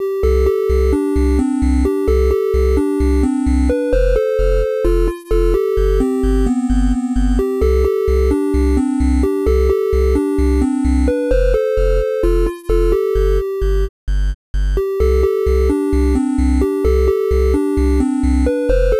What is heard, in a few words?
Yippee NES